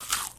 pinkie hit, monsters

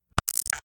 die, monsters tortuga

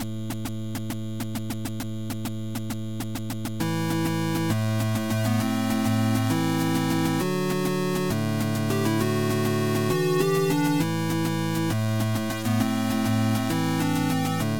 8bit; the hero

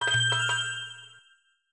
quest update

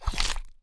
hits

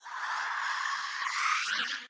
die, rotter, monsters

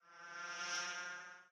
bee move, monsters

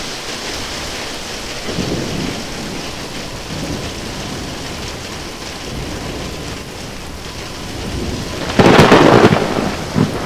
thunderstorm

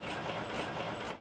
floyd move, monsters

